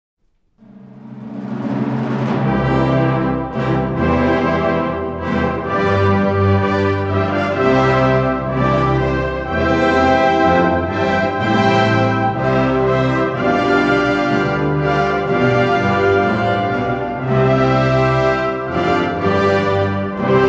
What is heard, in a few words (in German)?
Feuerwerksmusik